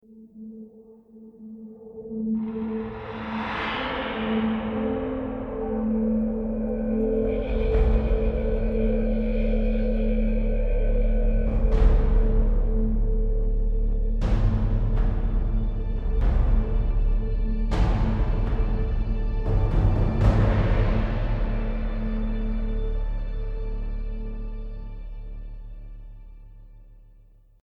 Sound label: music; national treasure